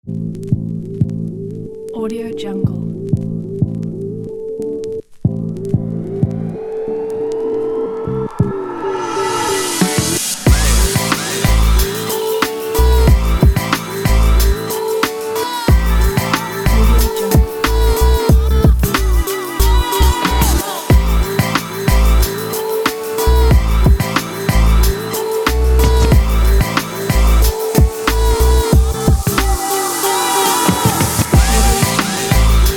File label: positive fun hiphop